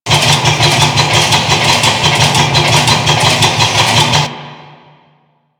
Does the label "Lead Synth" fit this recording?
No